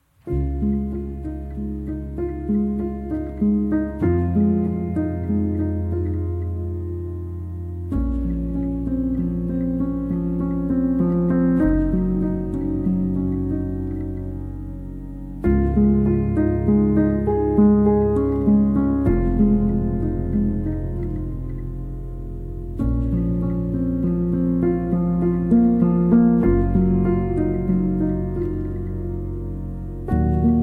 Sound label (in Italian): Piano